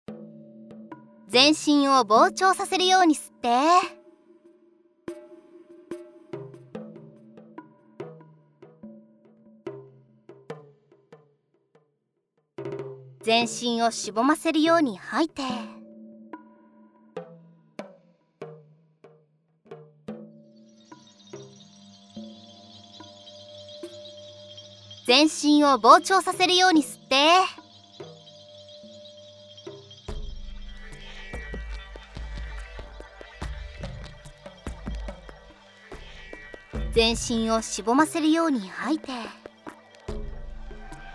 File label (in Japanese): 全身呼吸長めループ用